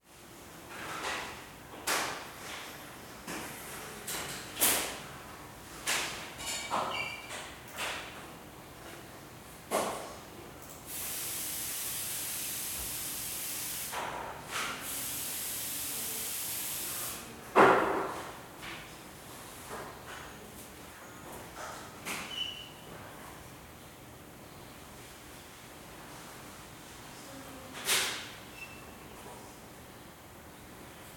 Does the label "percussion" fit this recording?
No